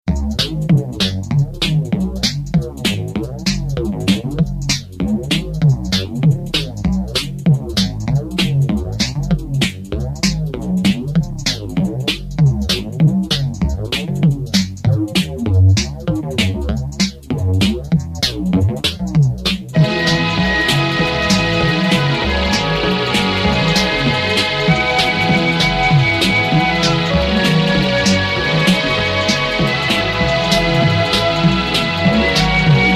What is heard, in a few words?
Trumpet